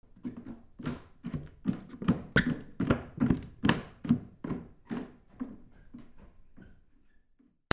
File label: FOOTFALL